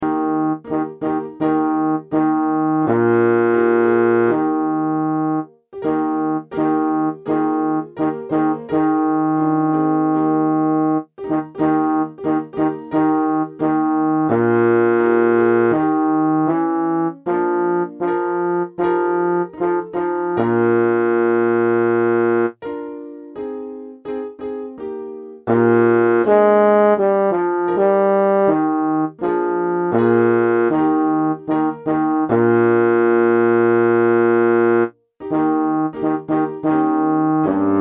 all in the april evening BASS

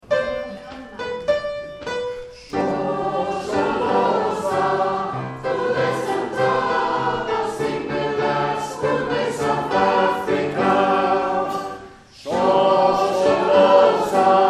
shoskolosa all

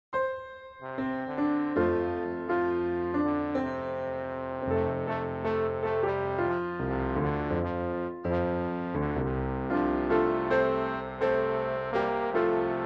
SOP

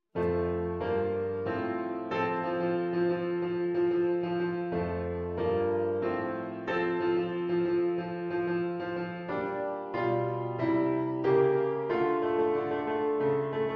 ALT

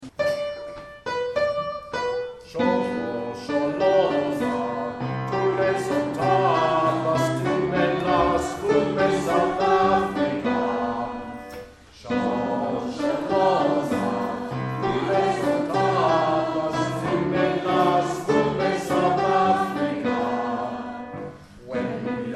Shosholosa lower; Shoskolosa bottom

